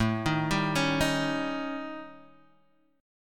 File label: A 7th Flat 5th Guitar Half Step Down